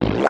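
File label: Cartoon Farts High Pitch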